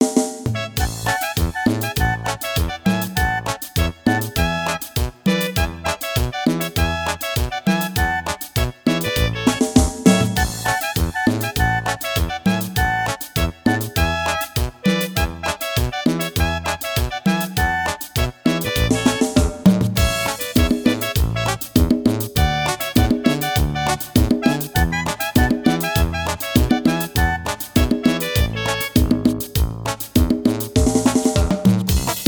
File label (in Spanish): Banda Cumbia